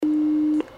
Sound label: bonk